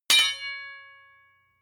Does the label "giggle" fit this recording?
No